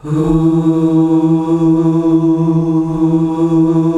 HUUUH E, male choir; Best Service; Hyper Dance

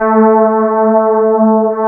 CMI ORGAN

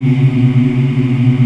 African Mm; Roland LCDP11 Africa VOL-1

